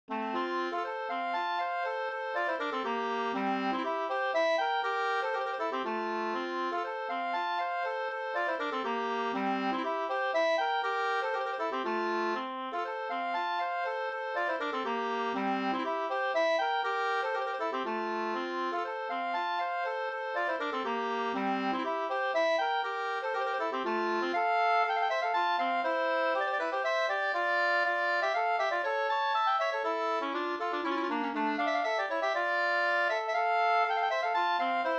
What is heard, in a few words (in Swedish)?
polska Bingsjopolska; e Blinda Palm e Pekkos Helmer 2st